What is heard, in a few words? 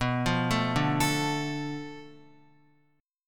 B-Minor 7th Flat 5th B down Guitar; Bm7b5; arpeggiated; JGuitar